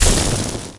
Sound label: welder hit flesh; tf2